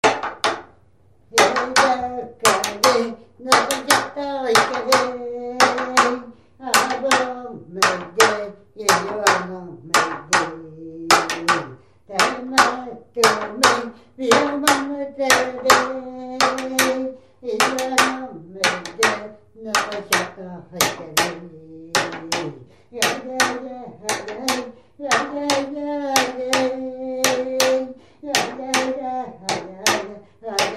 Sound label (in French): TAMBOUR